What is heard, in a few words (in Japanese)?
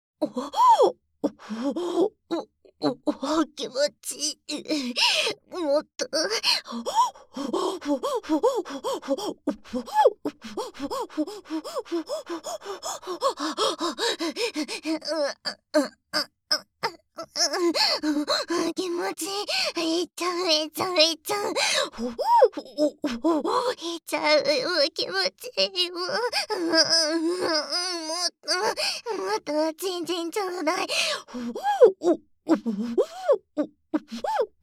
オホ声; SEなし